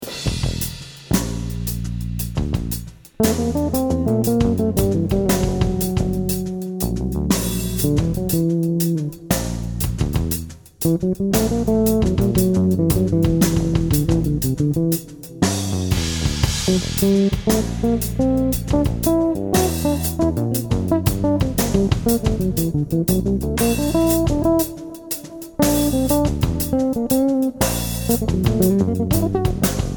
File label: bass solo